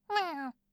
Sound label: miaow